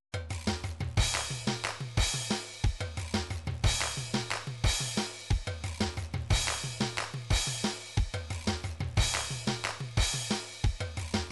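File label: drums pattern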